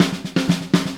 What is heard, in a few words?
Acoustic Fill, Drums